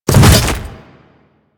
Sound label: Hammer Boss Step Ice, footsteps